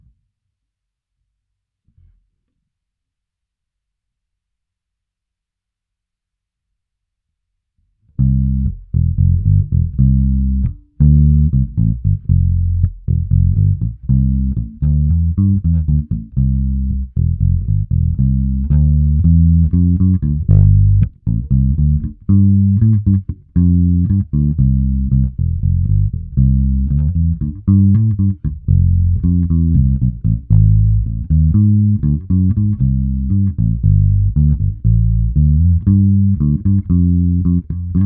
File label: Bass